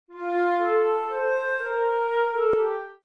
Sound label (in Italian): semifrase